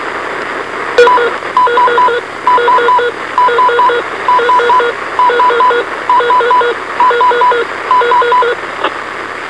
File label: Tone out